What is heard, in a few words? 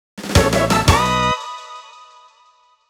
stinger joins cargo; sea of stars ost; solstice